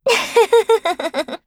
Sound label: laugh; Eternal Return; Garnet; KOR